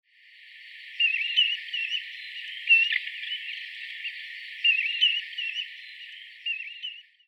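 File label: grey-tailed tattler, tatsuno; kiashishigi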